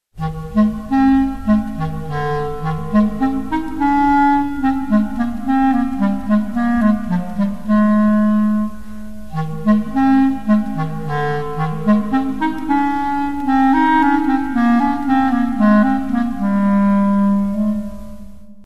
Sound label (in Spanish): clarinete